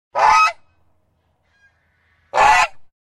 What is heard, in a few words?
Goose, A domestic goose; Shrek 2